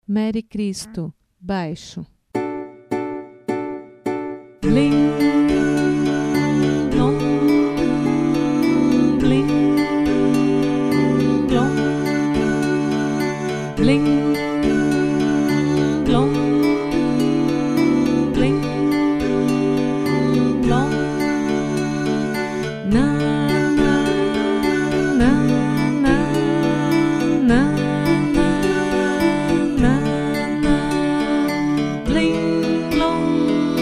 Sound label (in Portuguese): mary cristo naipe baixo